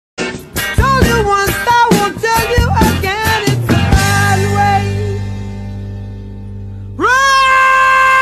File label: roaaaaaa